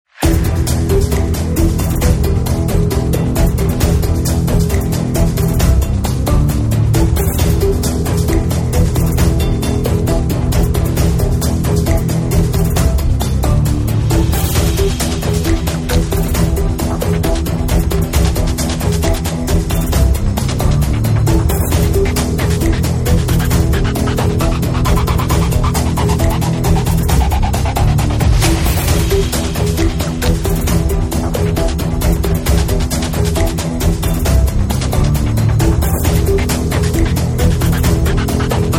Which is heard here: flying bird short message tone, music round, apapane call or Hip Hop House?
music round